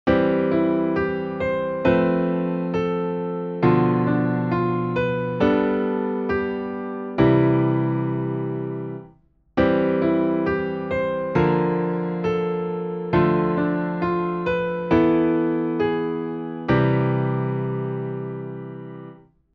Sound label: music